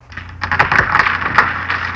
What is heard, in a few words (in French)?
bruit